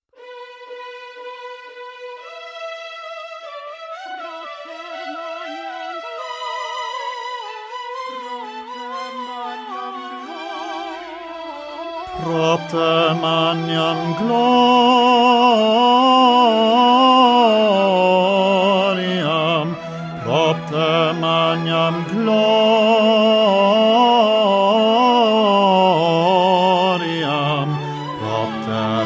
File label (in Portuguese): propter magnam baixo